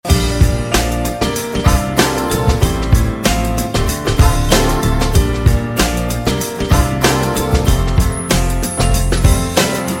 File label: Cute Ringtone